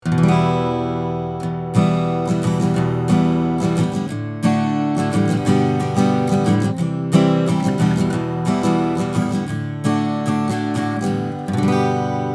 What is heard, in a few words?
AKG C12 Acoustic Git 40cm 15th Fret